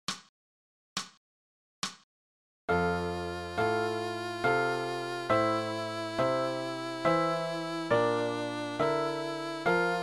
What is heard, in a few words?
French folk tune